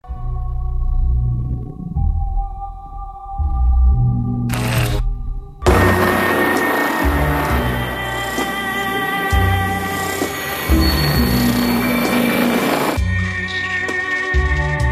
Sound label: american horror, Музыка из фильмов ужасов